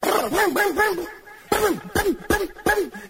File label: brrm boom boom boom brrp brrp brrp brrp, Voice; ooh ahh